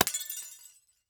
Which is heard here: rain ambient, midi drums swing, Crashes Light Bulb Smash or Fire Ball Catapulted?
Crashes Light Bulb Smash